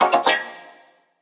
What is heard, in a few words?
Impact FX; Cymatics; Video Game Sample Pack Vol 1; Galaxy Rush